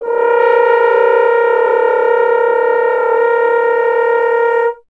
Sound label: F. Horn; Miroslav Vitous; Solo Instruments VOL-2